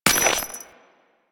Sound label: Step Ice, footsteps; Warframe; Grineer Royal Jester; Foley